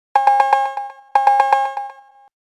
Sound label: Nokia Alerts; Windows Phone